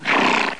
Wolf

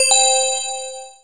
bonus; Geschicklichkeit; Pac Man Adventures 3D

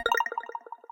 chain

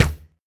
bow; kult

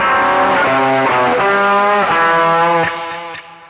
rock bit

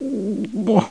PURR; Dogz; PC Gamer 34

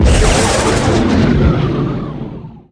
warp enter

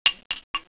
click

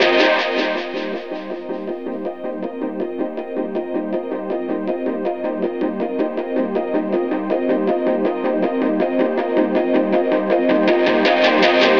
AMBNT 160; Ambient

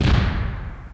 popup; balloon rain

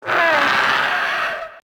drake vox die

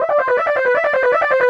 mudab, Techno Pad; Magix Music Maker V2000 Soundpool A

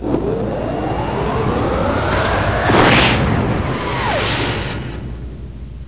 warp

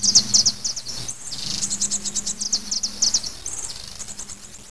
Dawn chorus; Notes on Notes

